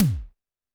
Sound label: TD-20 Tom A, Roland TD-20 Toms